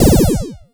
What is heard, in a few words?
ZAP DRUM, MACHINE PERC; Zero-G Ambient 1